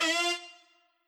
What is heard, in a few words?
sax